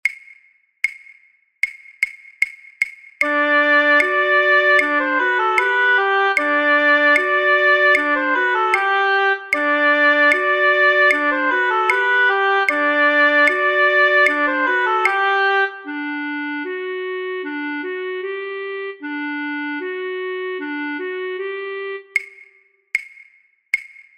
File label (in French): perc phrase b lent; Gavot